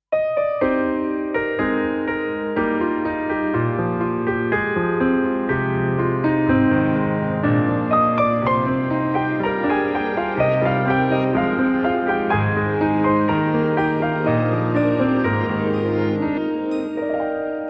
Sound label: Instrumental Ringone